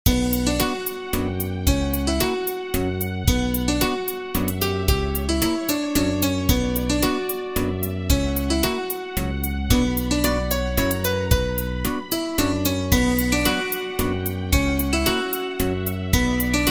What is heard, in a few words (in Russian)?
vals